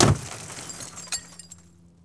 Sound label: icecube release